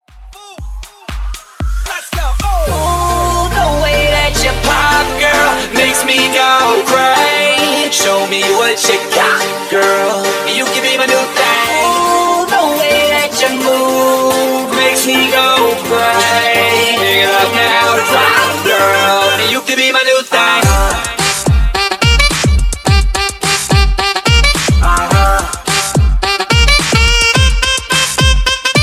Mixshow 123 107 BPM; Trans Down

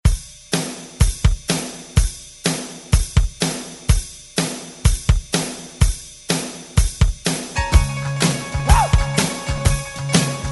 Re Drum 124 Bpm; DJDOLLS

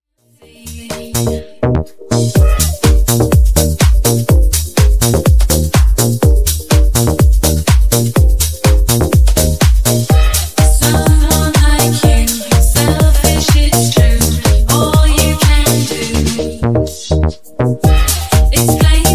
Disko Funk Remix 124Bpm

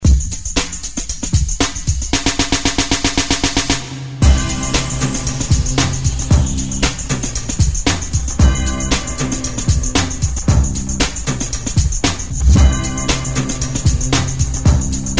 80s extended redrum

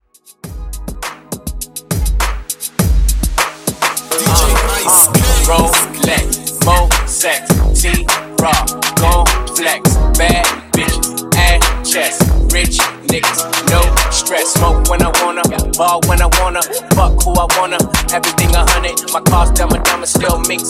Top40 HipHop In Outro 102 BPM

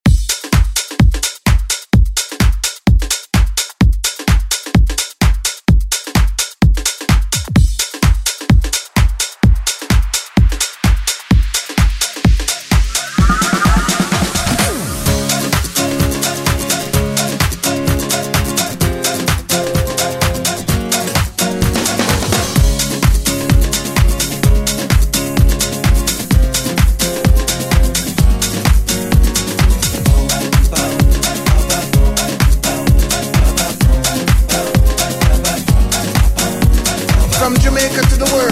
House Redrum; Deejay Hit

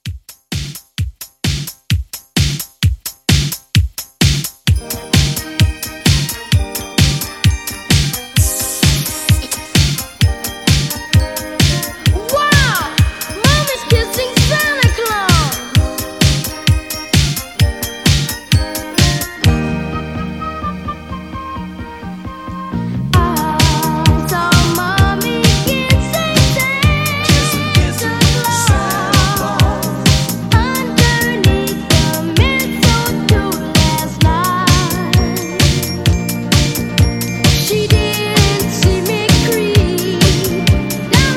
70s Christmas Re Drum, 130

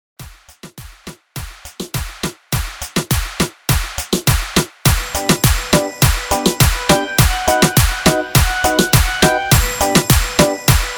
Moombah Redrum 103 BPM

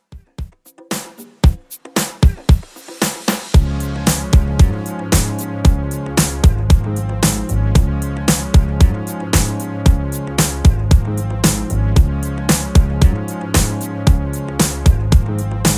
80s Redrum 114bpm

